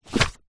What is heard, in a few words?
foley paper sheets construction sugar set down on surface; zapsplat